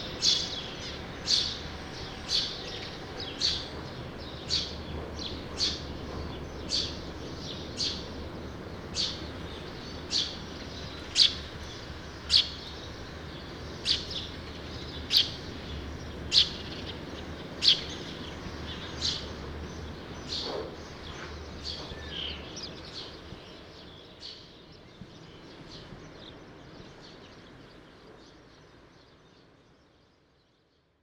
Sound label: True sparrows